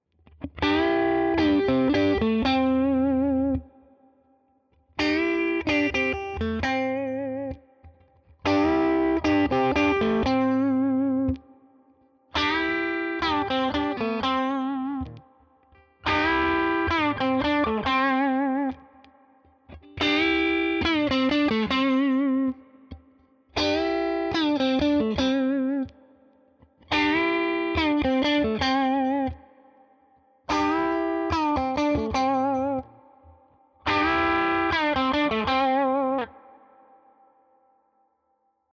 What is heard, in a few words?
Ibanez AZ SDB crunch Lick all positions